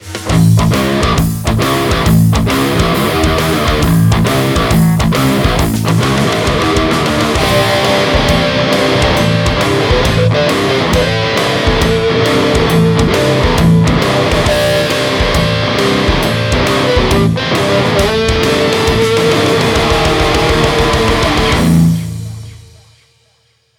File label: heavy